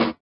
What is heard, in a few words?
SWUSH; Spectrasonics; Metamorphosis